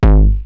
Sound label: Roland TR-909 BD; BD Selection